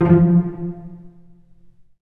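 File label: cello pizzicato F1; Digital Sound Factory Virtuoso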